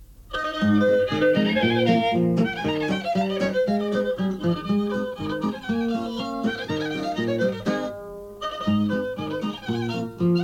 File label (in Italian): pizzichino